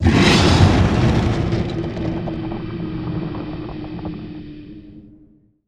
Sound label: heal; zaug; dota; crownfall